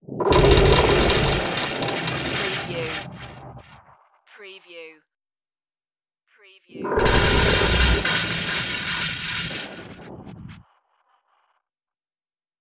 digital glitch eruptions

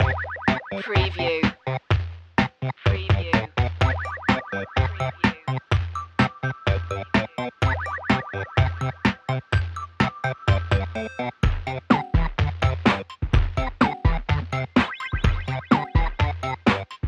8 bit instincts underscore

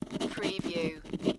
Pen Write On Paper

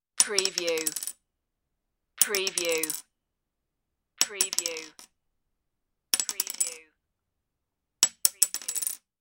piece of plastic drop

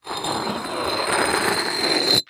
Brick Scrape Drag; sound-effects-bible

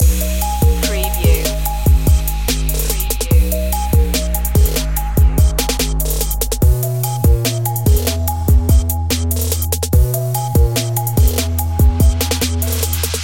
808 boom loop

